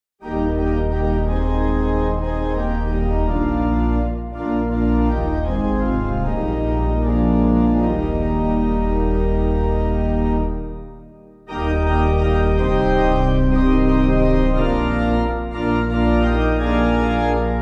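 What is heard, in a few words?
pipe organ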